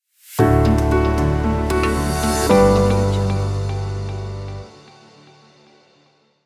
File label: new corporate logo